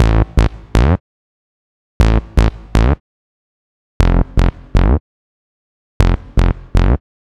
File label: bass Melo1; parasite Test